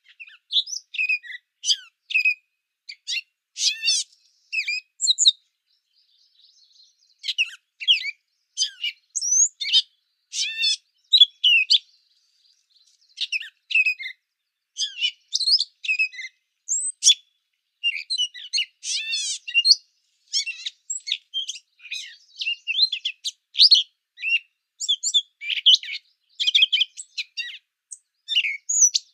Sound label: Catbird, Gray Chirps. High-pitched, Musical Chi; catbirds